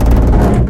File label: metal solid strain; physics; Garry's Mod; hl2